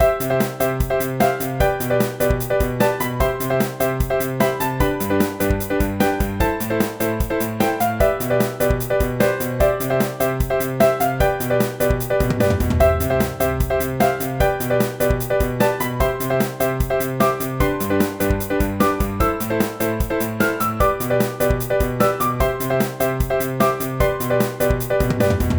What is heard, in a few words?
piano